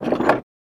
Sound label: Cessna Pedal Up, Sharp